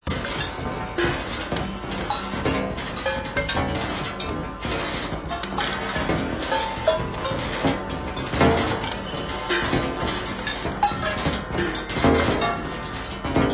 Metal Machines

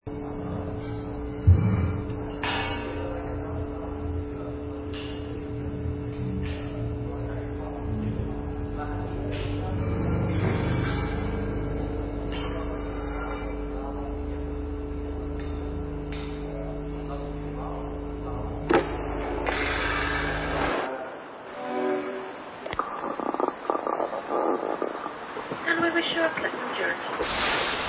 Hiss Lift

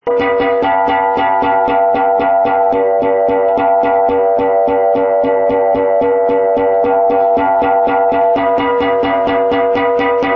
Chase Drums

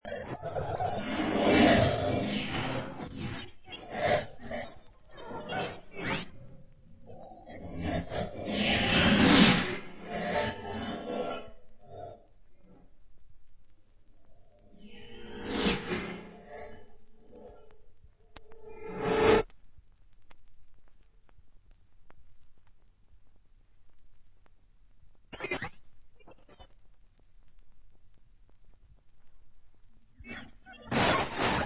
Electronic

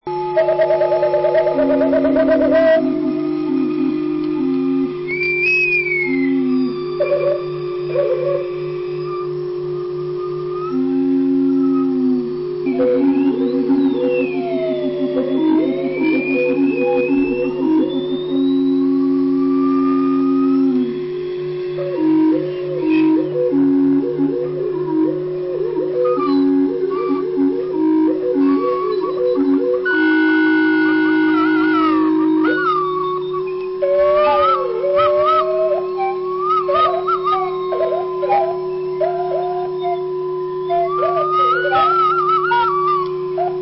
Pipe Organs B1

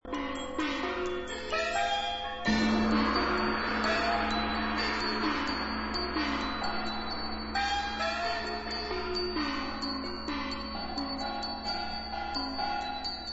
Double Music

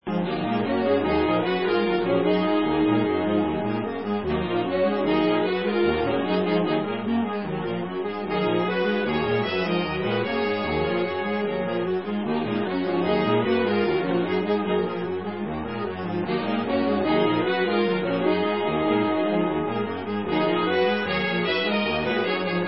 sax spiral

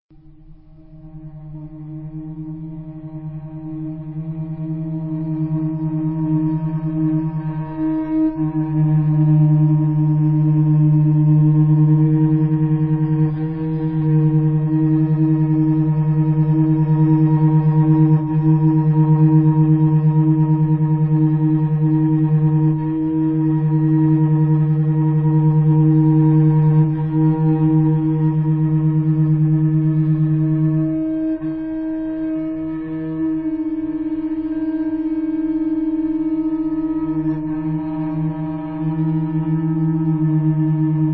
Music for Cello

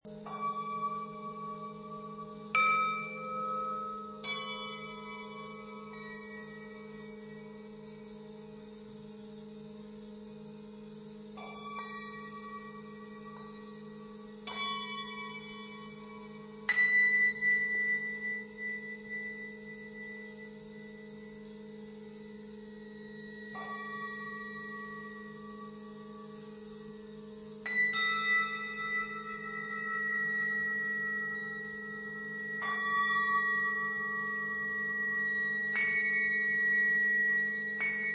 Overtone Ensemble

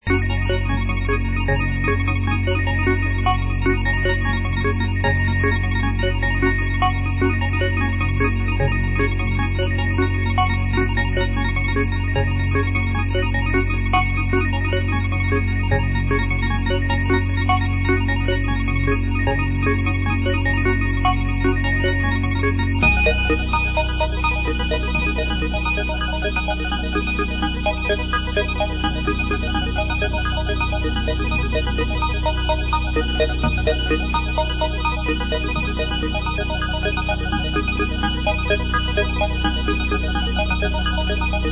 Pulse Music

